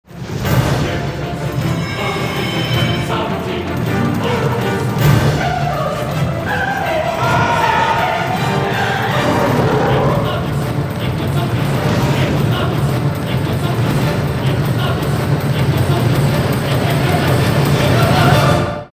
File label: trailer choral battle; narnia